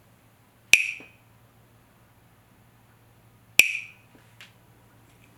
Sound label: wood bell; træ; Hver klokke har sin egen unikke lyd; Passer på almindeligt 22,2 mm styr; Gilles Berthoud